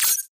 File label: Hack Completed Beep; Data Knife; Hack Spectre; Titanfall 2